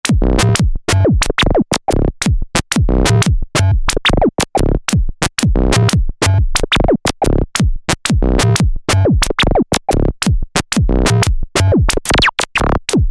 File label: Little Glitch